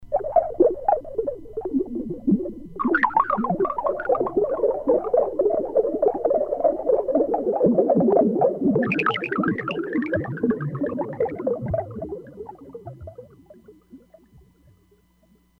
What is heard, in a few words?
Brook Babble, E-mu Proteus-3; Factory patches